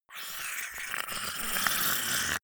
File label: monster choking zombie idle high; koerpergeraeusche; comedy-cartoon; soundpool